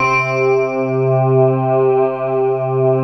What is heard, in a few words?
synth bell; VFXSD